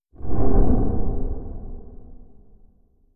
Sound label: Whoosh Resonant; bottlerocket